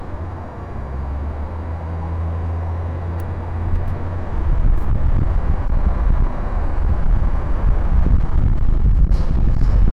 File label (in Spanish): sonido ambiente de ciudad; daytime